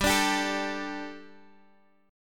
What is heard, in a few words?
G Suspended 2nd, Guitar, Standard; strummed; 15,17,17,14,x,x